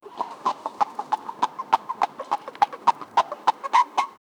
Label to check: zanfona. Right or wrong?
Wrong